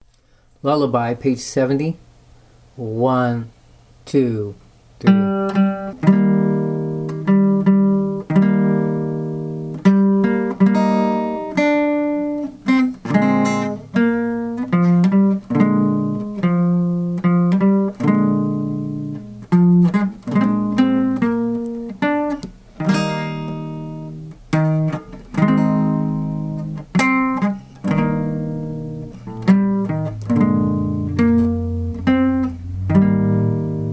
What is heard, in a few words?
lullaby